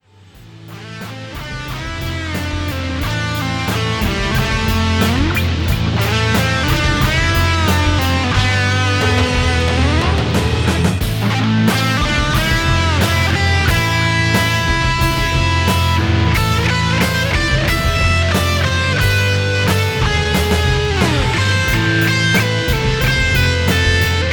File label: playalong solo